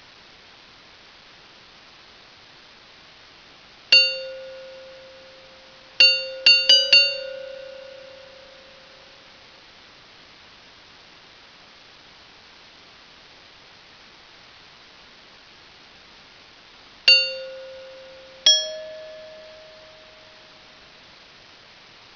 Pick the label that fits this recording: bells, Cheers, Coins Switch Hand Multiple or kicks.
bells